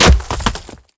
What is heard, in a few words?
spider monster foot hit